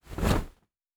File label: Cloth Handle; Survival Game Sounds - Lite Edition